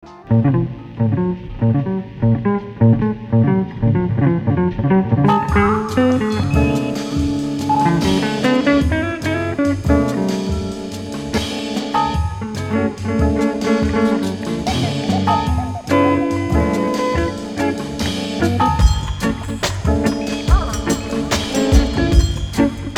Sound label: smooth jazz chillout; multitrax